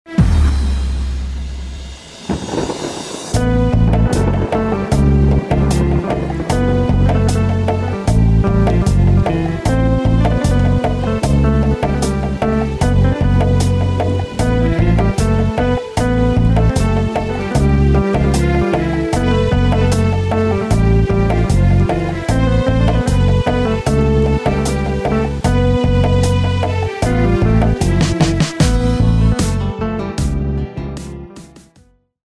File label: Beat Diss Love